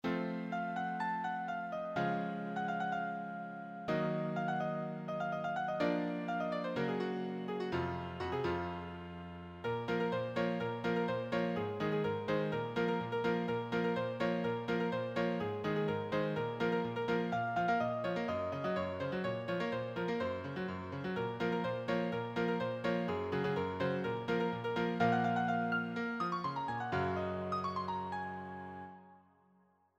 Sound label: solo, piano